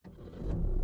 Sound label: vehicle sound engine